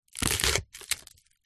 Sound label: food onion raw skin on slice chop knife